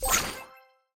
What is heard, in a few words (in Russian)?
Получение награды карта